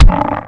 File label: zombie block; Hellboy